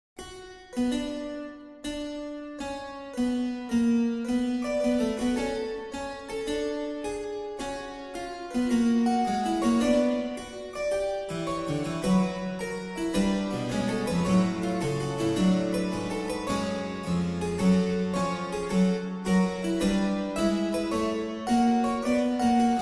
fugue in b minor

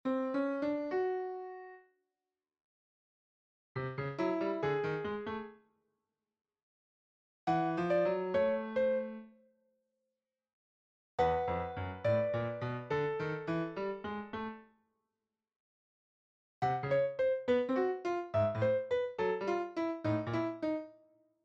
Counterpoint Examples

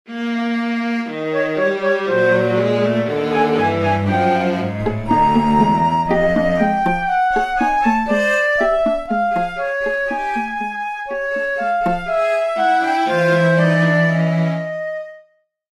Allegro Con Brio

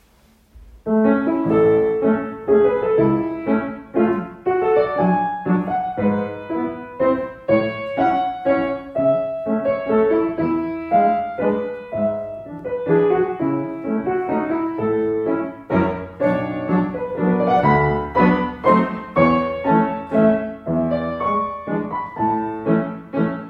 Home recording Waltz A major

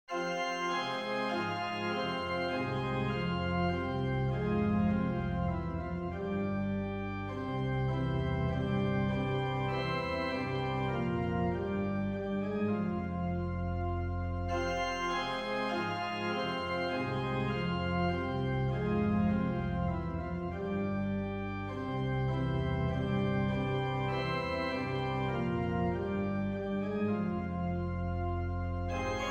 Soliloquy for Organ; no 8

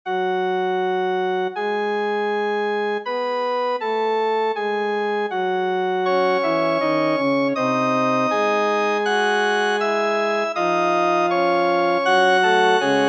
fugue in f sharp minor

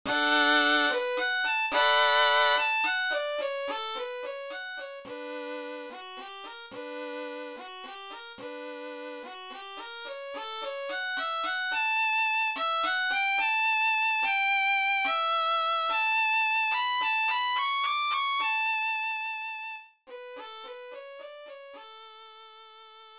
dance for violin

